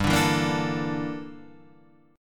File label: G Minor 6th Add 9th G; Gm6add9 Chord {3 5 2 3 3 5} strummed